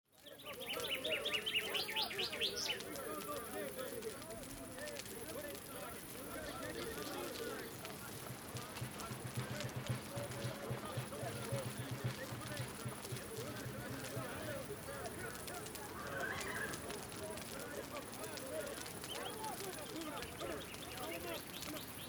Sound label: Camp; Age of Empires III; cinematics